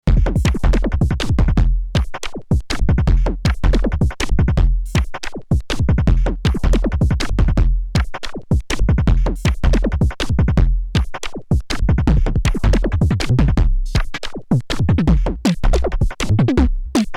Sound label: IDM; Erica Synths Perkons HD-01